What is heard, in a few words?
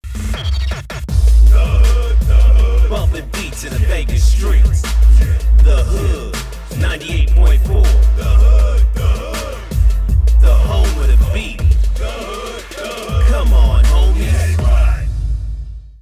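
radio jingle; The 'Hood 98.4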